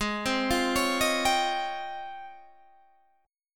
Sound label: Db Major Sharp 11th Ab